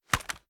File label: sh raise first end; viper